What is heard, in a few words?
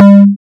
tom